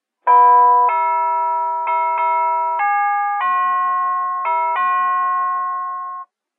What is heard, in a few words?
auld lang syne, door chimes